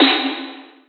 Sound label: Trap Oneshot; Sonic Foundry (Sony Creative Software); Esoterik Beatz